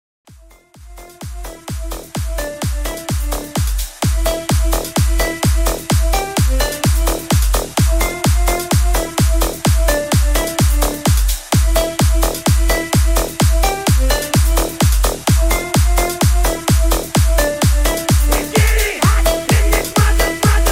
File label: MELBOURNE BOUNCE 128 BPM